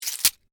Scorpion Tail Snap, Stinger, Attack; Animal; Arachnid, Insect, Bug

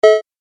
Game App Error Beep; video game

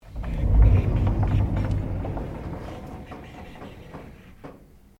Door Sliding Interior Aluminium Glass Open; Various doors

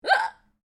FEMALE HICCUP; Female coughing and hiccups; Hiccupping; Human

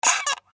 Button Squeak Push; Web Interactive; Technology; Multimedia; Blastwave

